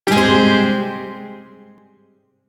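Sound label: Shawzin Minor Chord; Warframe; Duviri Shawzin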